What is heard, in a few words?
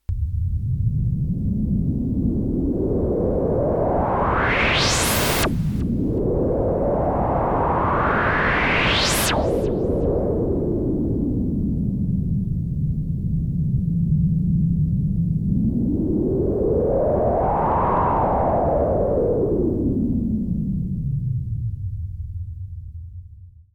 thunder; neptune; spectral